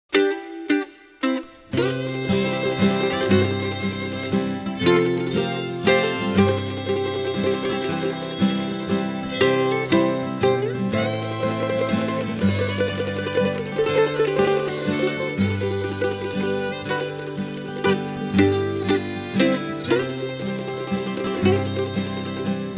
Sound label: old time gospel instrumentals